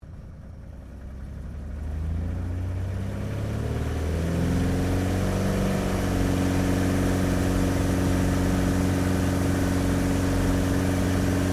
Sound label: Aircraft CT4A Take Off Interior, CT4 military training aircraft; Airplanes; Vehicles